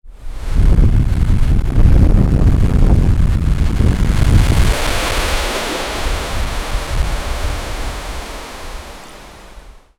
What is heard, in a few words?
a massive tsunami wave; disaster